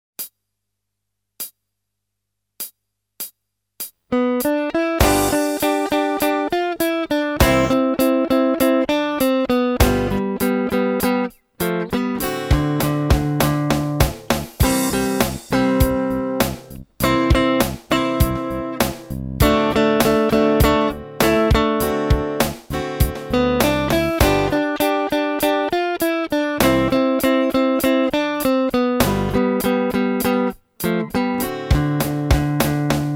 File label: Mixolydian Riffs, guitar